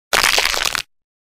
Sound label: Bone crack sound effect